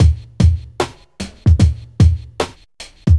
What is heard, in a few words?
hiphop